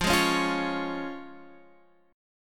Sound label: F Minor Sharp 5th, Guitar, Drop D